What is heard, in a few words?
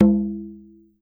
TUBANO; E-MU Producer Series Vol. 7 – Old World Instruments